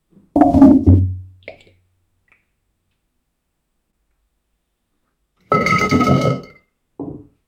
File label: man falling down a well